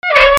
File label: SQUEAKS